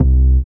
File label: BAS, Dance Bass